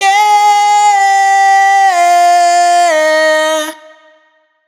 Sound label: riff Yeah Long Fm wet, vocal; Slate Digital Omnivox Vocal Sample Pack